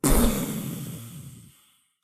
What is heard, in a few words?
splosions; SyrinScape; Syrinscape unplugged; Jungleplanet dinolaser battle